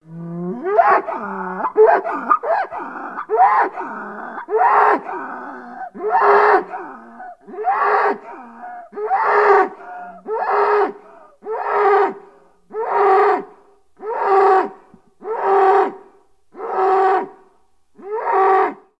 Cattle, Cow Bellow, Yell. Multiple High-pitched Bellows, Medium Yells, In Pain. Medium Perspective.